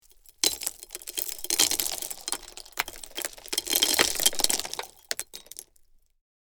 Slowly pouring small gravel

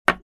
Small wooden blocks set down; wooden sound effects

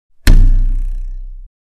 Crossbow arrow hit

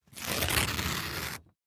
Thin paper tearing

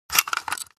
Stapler stationery office handling

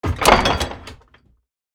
Ship door hatch open

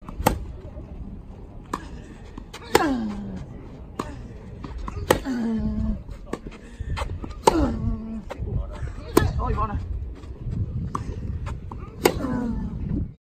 Tennis court ambience hard court

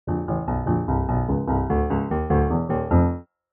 Cartoon angry walk

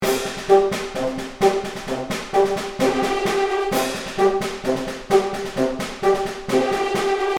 Field drum and brass marching loop

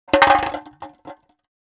Empty paint can setting down

